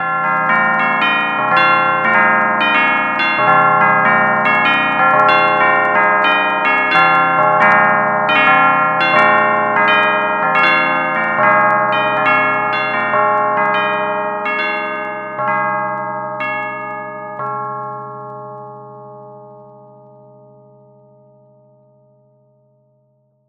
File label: SHRT PEAL, chimes